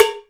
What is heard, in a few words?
COWBELL, Korg Electribe EMX-1